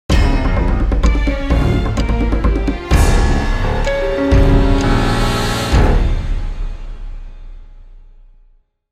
Fight Lose; music; The Da Vinci Code; XBOX

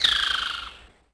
bird Call; theed; Star Wars Obi-Wan; XBOX

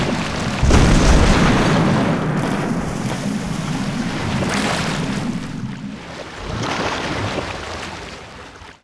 ship destruct; Sid Meiers Pirates; XBOX

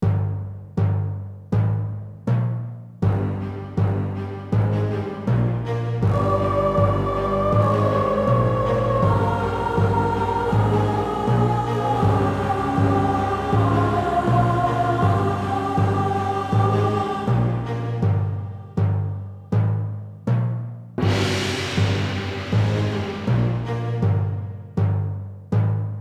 music boss level; TyTheTasmanianTiger3; XBOX

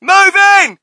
crowd, shout; Mike Tyson Heavyweight Boxing

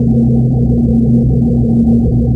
rings, ambience; bespin

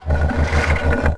boards flip; skate shop; Tony Hawk's Project 8; XBOX

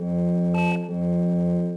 computer; Star Wars Knights Of The Old Republic II; XBOX

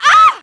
yell; Matt Hoffman's Pro BMX 2; XBOX

